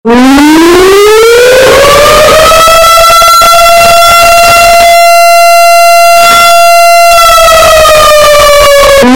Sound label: loud siren sound